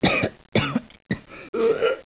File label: Ukyo coughing